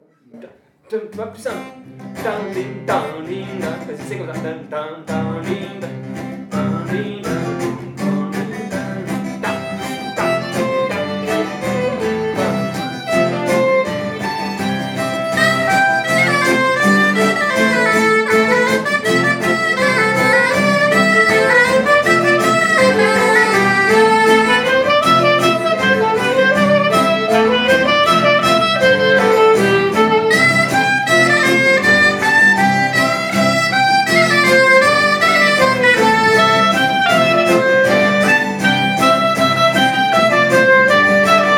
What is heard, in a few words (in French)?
rythme guitare; kost ar hoat